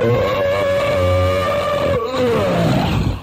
burp long painful

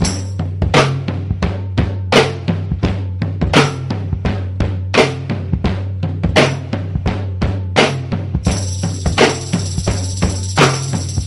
tame boring, drum loops, funky

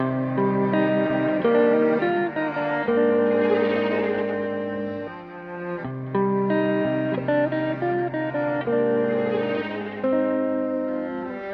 guitar and strings; Loops

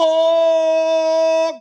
"fatal", Voice

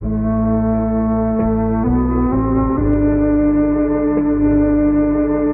flute, Loops, Sad

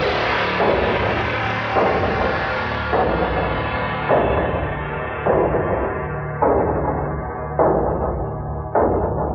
keys, Sad; loops

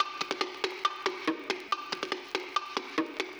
spanish bongo, drum loops

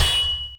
forks, Bells; Misc Percussion

